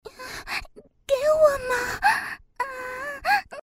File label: moan; Imperial Girls